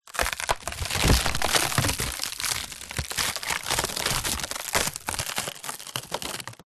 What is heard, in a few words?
impacts wood collapse break splinter